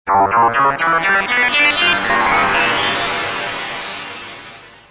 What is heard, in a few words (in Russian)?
sintezator effekt